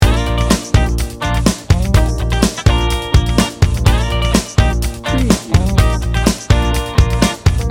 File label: happy funky guitar loop